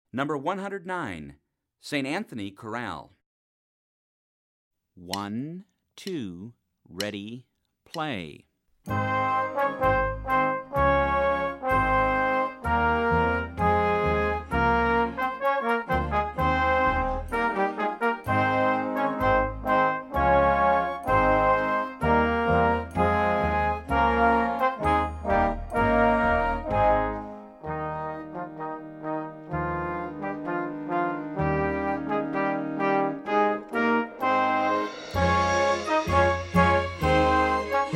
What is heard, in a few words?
chorale